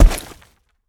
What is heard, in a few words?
Enemy Boss Fall; Game rip; MiSide